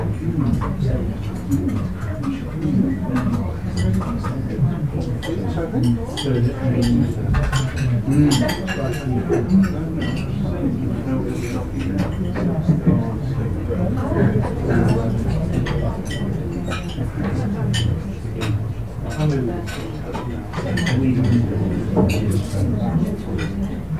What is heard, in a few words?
coffee shop; OpenValley